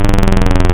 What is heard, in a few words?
Flux Saw Flange, wavetables; Animoog